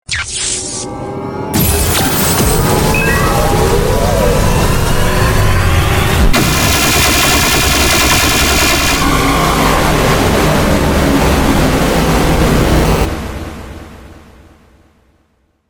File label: powerful energy ball sound